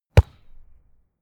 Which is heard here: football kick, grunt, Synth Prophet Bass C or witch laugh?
football kick